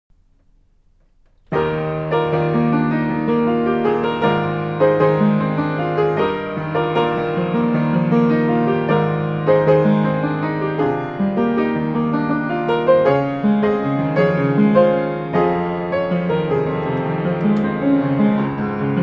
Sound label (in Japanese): ピアノ; 板橋区⽴ 志村第四中学校 3年 B2 課外部門 歌曲以外; 第56回 東京都中学校 音楽創作コンクール